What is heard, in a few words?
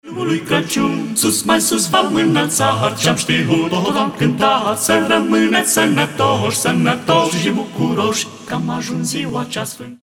Voice Quartet, Colindita; craciun